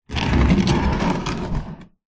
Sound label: wooden box crate scrape movement on concrete; foley; zapsplat